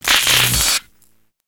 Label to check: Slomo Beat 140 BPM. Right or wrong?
Wrong